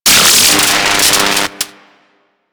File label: Ocean Electric Surge Large; Ambience; Warframe; Grineer Ocean